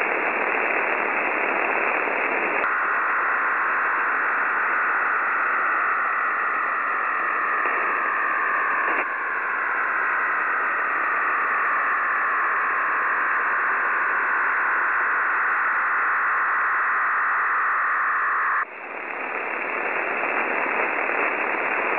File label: websdr recording 7045.0kHz